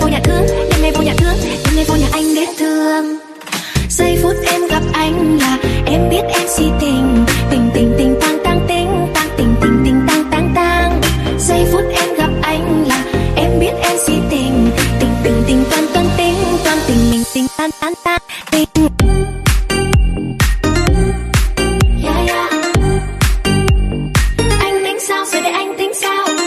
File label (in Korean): ddingddingddangddang